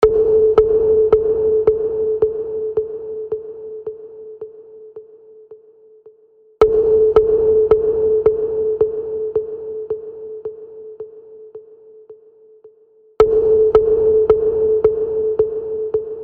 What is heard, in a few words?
82 Am wet; EHXSSP PING